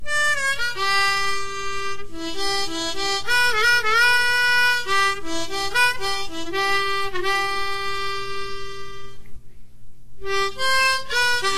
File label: harmonica